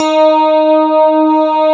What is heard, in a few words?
CLAV, FUNK FM CLAV